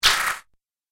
Laser Alien Zap, laser gun; Twistedtracks

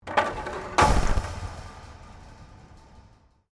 Wood Planks Impact Wood Wire; Materials

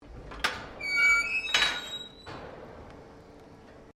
Door Boiler Open, Colonial era objects; Door 19 09 Thompson Boiler Open; Miscellaneous Doors

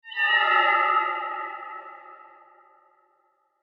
Horror Ghost Cry; Ghost Sound Effects; Ghosts (Sound Effects:Horror); SFX Bible 6

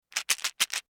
Medicine Bottle Shake Movement Small; Emergency; Pops; Objects

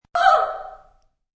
Woman Fear, Woman is startled; Woman in Fear; Exclamations; Voice

